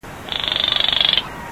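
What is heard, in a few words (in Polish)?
karliczka, chrusciele rallidae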